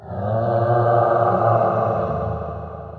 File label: Phoenix Attack